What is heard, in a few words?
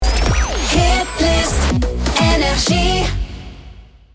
Jingle; NRJ